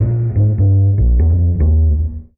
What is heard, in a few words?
bass, Loops, Phonky